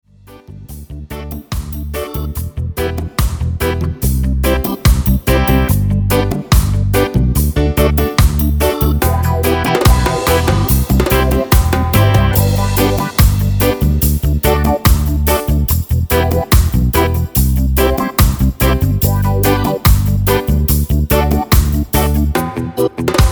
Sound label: Reggae; TYROS 1; Genos